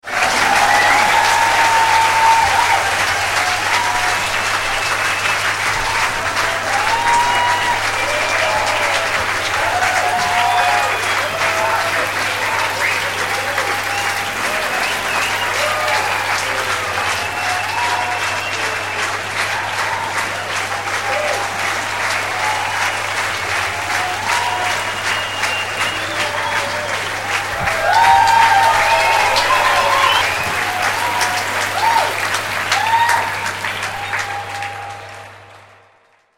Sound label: big applause